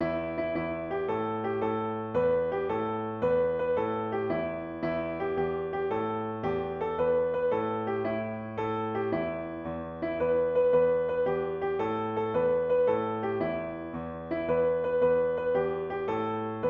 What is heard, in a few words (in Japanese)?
ピアノ